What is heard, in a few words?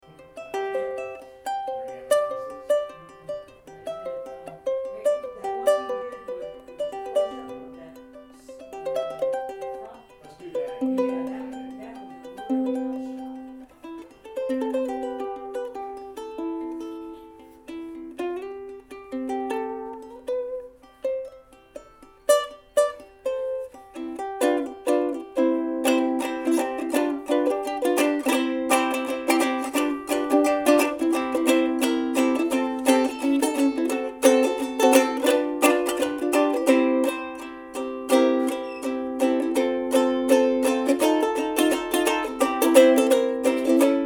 Instruments Mand